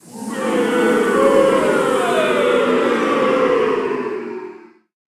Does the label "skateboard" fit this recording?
No